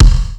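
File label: bass drum gate; 300 Drum Machines; Yamaha Motif ES-Series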